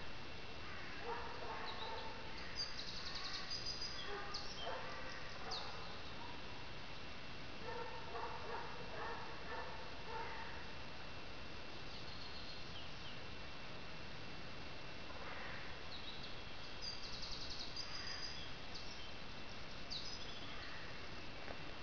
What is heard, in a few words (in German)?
hund